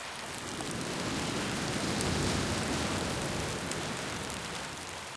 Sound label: rain, weather; HunterZ G4; minecraft